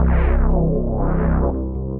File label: Queasy Pulses; Tense Pulses